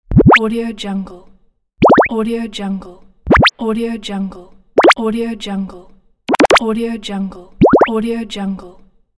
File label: pops bubbles sfx